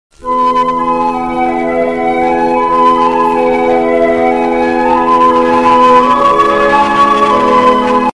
Flute Sms

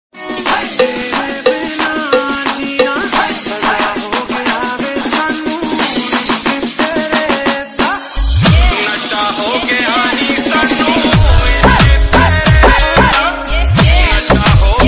Remix Ringtone

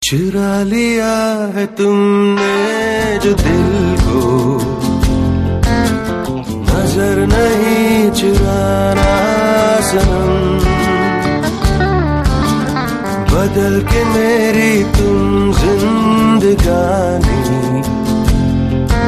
Unplugged (New Voice)

